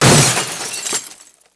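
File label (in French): vitre cassée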